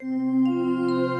logoff

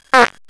fart

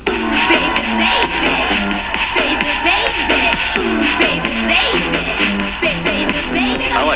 baby

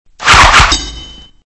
throwing

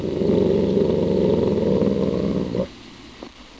growl

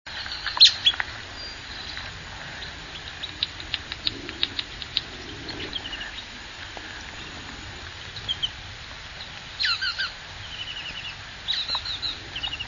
nuthatch brown-headed; birdsongs

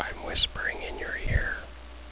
m whisper

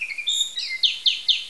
Bird

